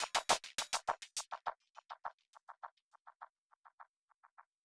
music endloop percus; music ftue endloop percus 05

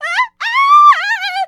Enemy Generic Human Death Eaten

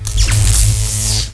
Lighting Cast; Knight Online

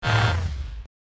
Music Frontend

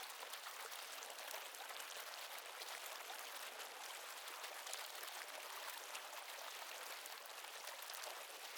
Drinking Fountain; Rabbids Multiverse

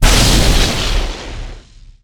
Mega Laser Burst

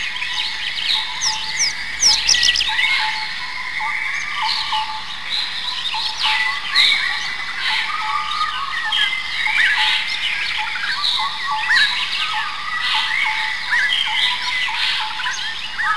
Amb Greenhouse

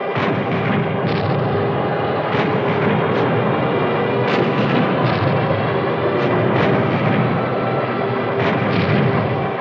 Eagle Fall

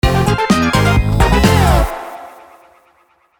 Mus Win; Mindwave

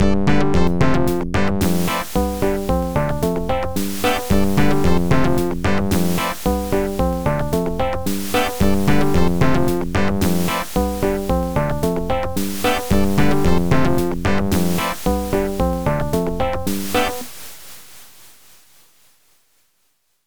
Conga; Fruit Conga; Putt-Putt Joins the Parade; 3DO, Android, iOS, macOS, MS-DOS, Windows

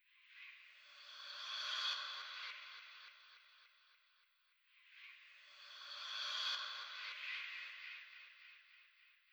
Loop End C

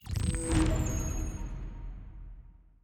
Level Complete

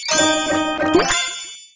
Reward Standard Ui; Superbrawl

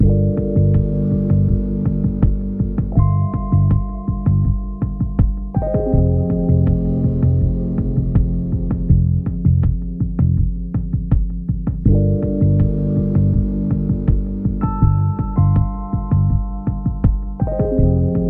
BGM Outpost; starbites

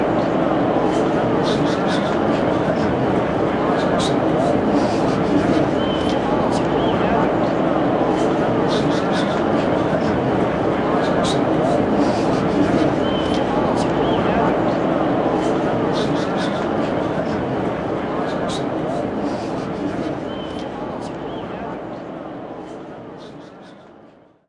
Basketball

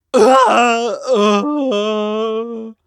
Maggot Death

